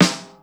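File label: snare ring, Yamaha Motif ES drum machine; 300 Drum Machines; Yamaha Motif ES-Series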